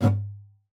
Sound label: GUITAR FX; Xsample VOL-2